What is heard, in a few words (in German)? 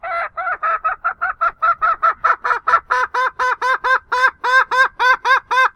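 Huhn fängt an zu gackern; salamisound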